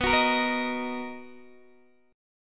B Major 7th B down Guitar DADGAD

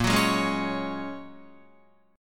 Bb Minor 7th Sharp 5th, Guitar; Bbm7#5 strummed

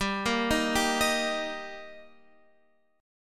Eb Major G

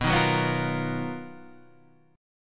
B Major Sharp 11th, Guitar, Standard; BM#11; strummed; JGuitar

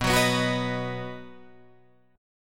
B Major, B, down, Guitar Drop D

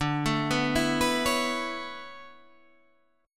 B Minor 11th D

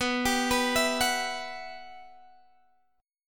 G Major 13th B

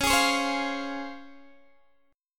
C sharp Major 7th C sharp Guitar DADGAD

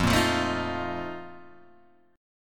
Bb Major 7th Suspended 4th, F, chord

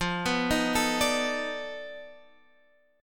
B 7th Sharp 9th Flat 5th, guitar

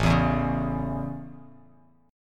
A Major, Bass Standard; strummed

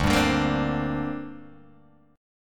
D 7th Suspended 4th Sharp 5th, Guitar, Open G

